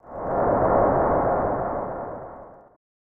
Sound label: Crowd Noise; SNES; hit the ice